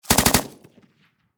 Warfare Machine Gun 7.62 Caliber Pkm Russian Short Burst Distant Perspective; SFX Bible